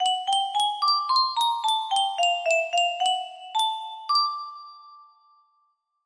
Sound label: eyecatch music box; mee happy lesson